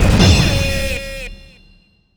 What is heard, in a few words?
Great Full Combo